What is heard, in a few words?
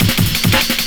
Amen, breakz